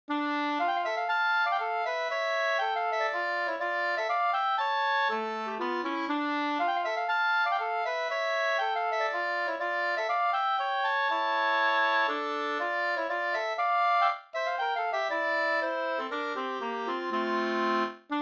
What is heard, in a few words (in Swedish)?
polska; Gästrikland, upptecknad och arrangerad av Herbert Jernberg; med andrastämma av Herbert Jernberg